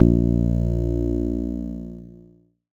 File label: PLUCKED, Synclav FM, A#; Big Fish Audio